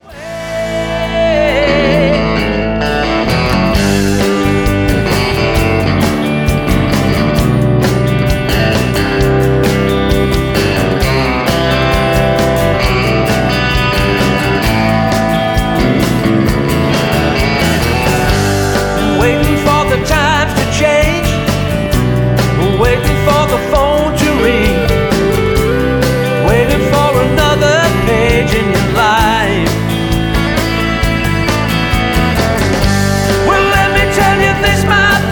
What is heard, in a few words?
Baritone Solo